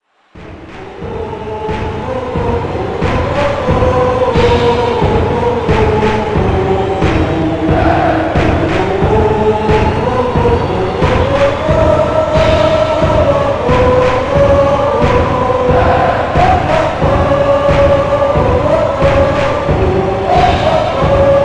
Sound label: Chants; Jupiter